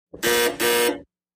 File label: Front Door Buzzer